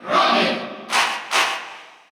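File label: Cheer Russian, crowd; Robin; SSBU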